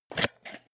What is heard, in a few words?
scissors cut paperboard